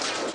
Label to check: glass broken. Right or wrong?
Right